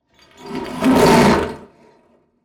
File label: Cinematic metal whoosh